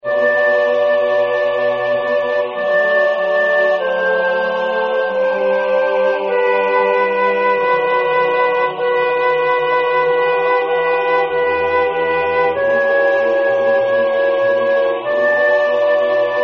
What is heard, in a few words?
Crucifixus, Soprano; Zelenka; MissaPaschalis